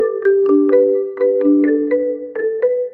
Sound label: notification